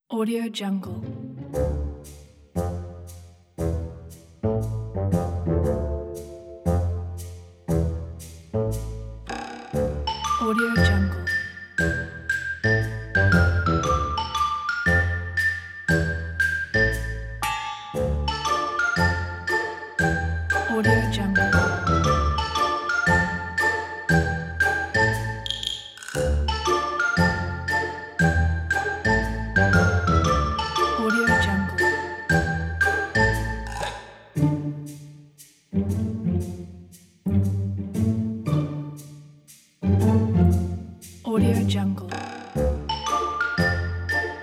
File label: sneaky spy